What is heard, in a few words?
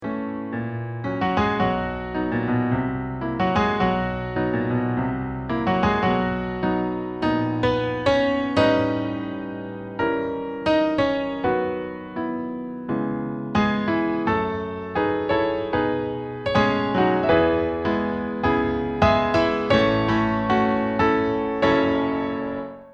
duet accompaniment